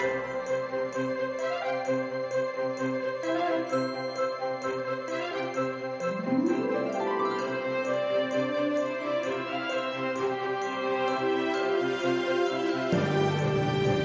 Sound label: Normal Bg Bgm